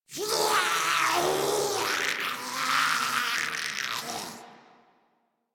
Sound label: Arm Pain; en; Warframe; Grineer; Ghoul